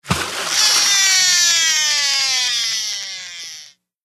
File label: Fish Reel Strike Line, fishing